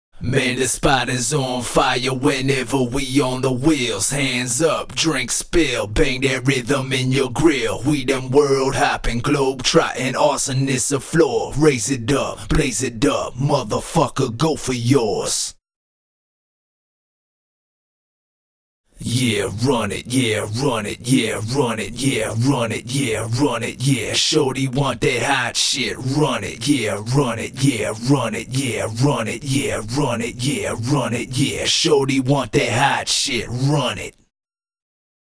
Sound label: Acapella 128bpm